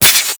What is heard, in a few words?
Inflate; Peculiar Inflation Mod